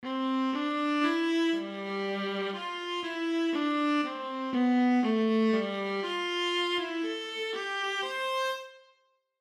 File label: Alto Clef Example Viola